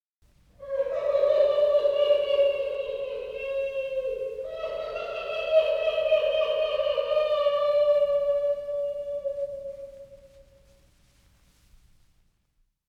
Scary Sound Effects Witch; Vincent Price

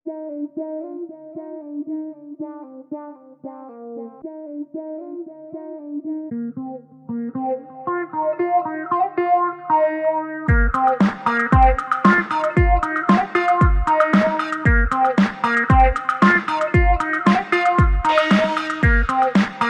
Effect Beat

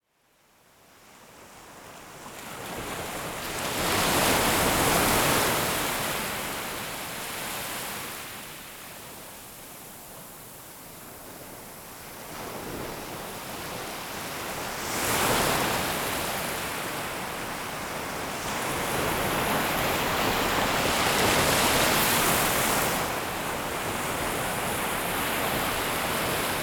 Ocean

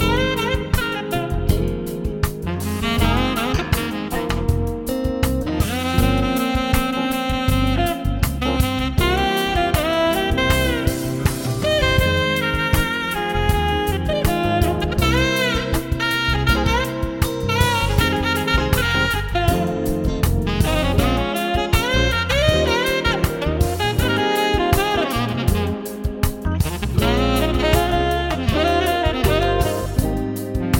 Latin Jazz

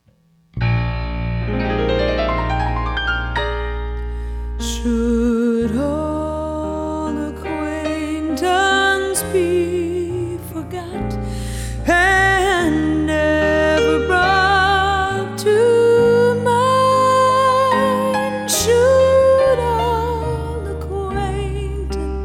Auld Lang Syne, Christmas Party Music

